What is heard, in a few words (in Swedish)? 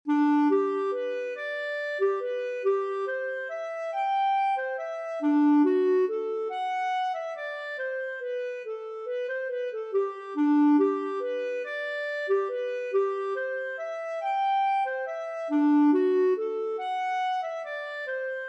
vals